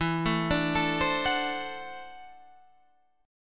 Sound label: D 9th E, guitar; D9/E; arpeggiated